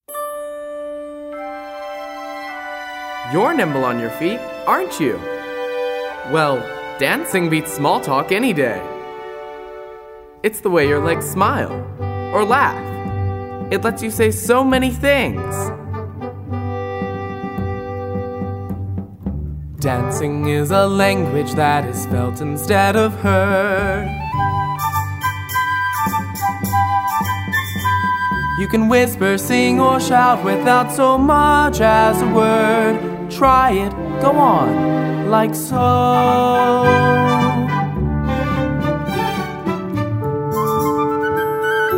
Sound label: vocal